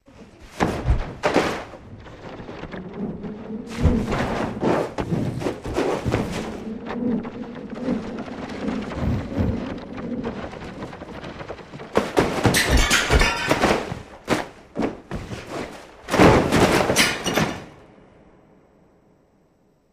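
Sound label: Books Fly Through Air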